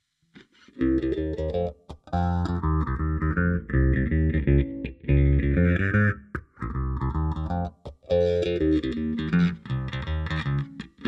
Jazz Bass Pick Phaser

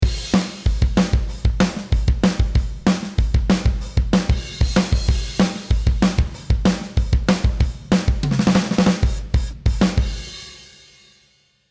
Superior Drummer 3 Rock Metal Magnetic Tape

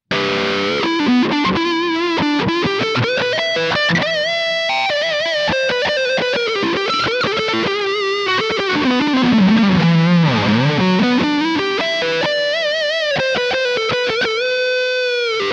Epiphone Les Paul 50s Neck Highgain Lead

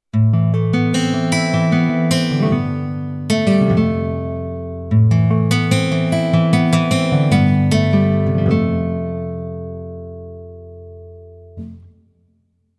Gypsy Guitar Open Wide

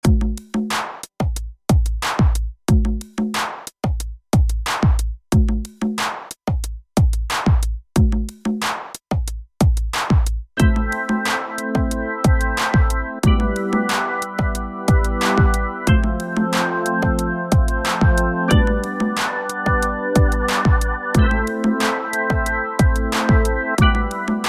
X6 Plugin Roland

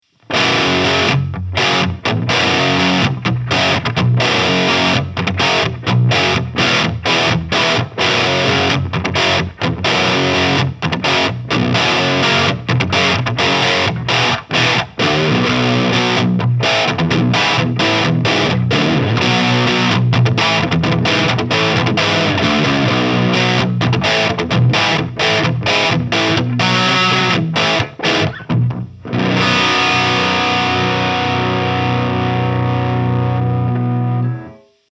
Baritone Heavy Crunch; Solar Guitars V2

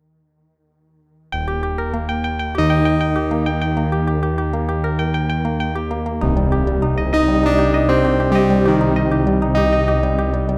Dual Piano Layer; Super Gemini; Super 6

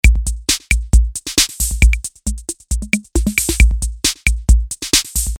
Ace Tone

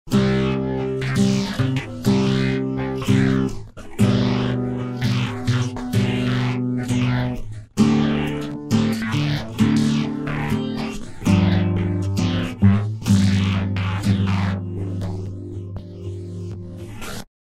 Violet Drive; EFX Motions

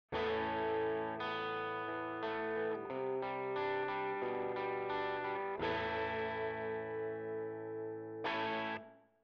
ToneX Pedal Reverb Spring

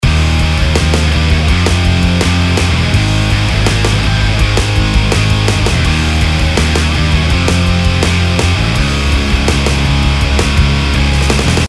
Rock

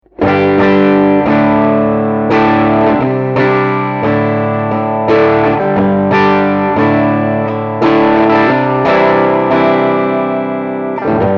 ac 30 crunch

